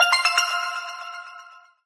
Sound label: Alarm Clock; Lenovo; P770; alarms